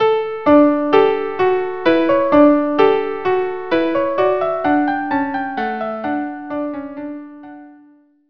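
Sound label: marche violin violin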